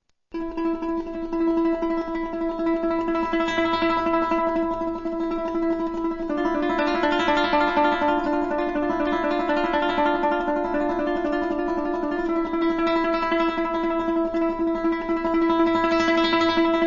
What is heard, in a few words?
strumm